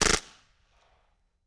MAC-10 Silencer Short Burst; Weapons & Firearms; Sony Pictures Sound Effects Series Volumes 1-10; Sony Creative Software

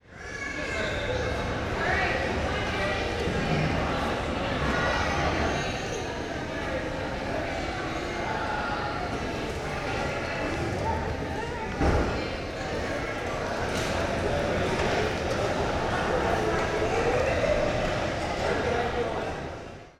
Gymnasium Interior; Sony Pictures Sound Effects Series Volumes 1-10; Sony Creative Software

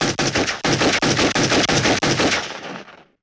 Pistol Shot 9mm Semi-Auto Burst; Weapons & Firearms; Sony Pictures Sound Effects Series Volumes 1-10; Sony Creative Software

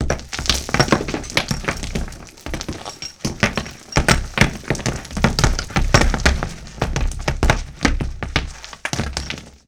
Debris Chunky Foley; Impacts & Destruction Sweeteners; Sony Pictures Sound Effects Series Volumes 1-10; Sony Creative Software

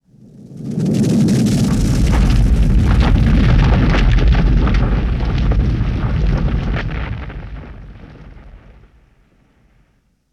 Landslide Rock & Debris Heavy; Impacts & Destruction Sweeteners; Sony Pictures Sound Effects Series Volumes 1-10; Sony Creative Software

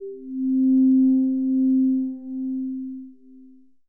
Bowed Vibe, Hits & Tones; Sony Pictures Sound Effects Series Volumes 1-10; Sony Creative Software

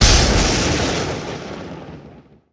Lightning Strike, Thunder & Lightning; Sony Pictures Sound Effects Series Volumes 1-10; Sony Creative Software

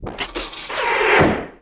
Arrow Movement Slow, Weapons; Weapons & Explosions; Sony Pictures Sound Effects Series Volumes 1-10; Sony Creative Software

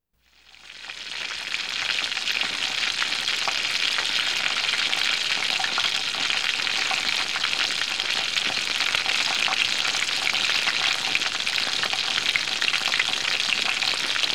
Cooking Pan Sizzle; Home & Office; Sony Pictures Sound Effects Series Volumes 1-10; Sony Creative Software

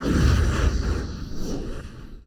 Lightning Sweetener; Sony Pictures Sound Effects Series Volumes 1-10; Sony Creative Software

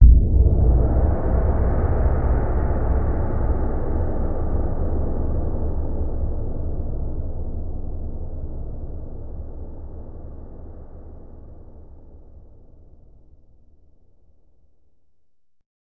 Jet Swell Low, Hits & Tones; Sony Pictures Sound Effects Series Volumes 1-10; Sony Creative Software

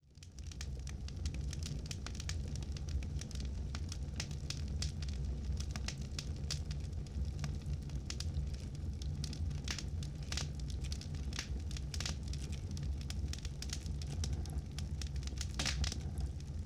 Campfire; Sony Pictures Sound Effects Series Volumes 1-10; Sony Creative Software

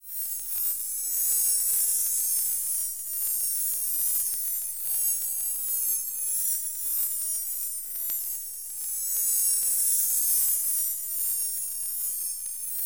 Shards & Taps; Sound Design - Fantasy; Sony Pictures Sound Effects Series Volumes 1-10; Sony Creative Software

